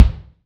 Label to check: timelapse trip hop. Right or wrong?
Wrong